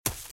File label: Footsteps Leaves Sprint Left; RadwinIsland